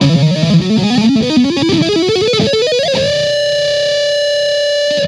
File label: Blow up